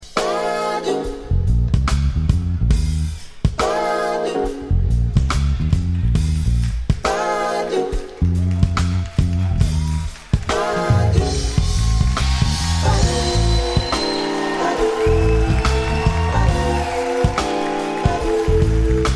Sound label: rimshot